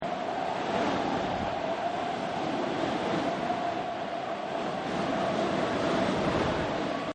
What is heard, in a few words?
wind loop; phineas and ferb; snowbrawl